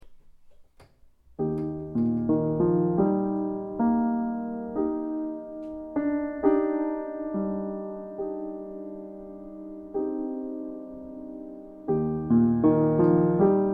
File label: prelude op10; melanie bonis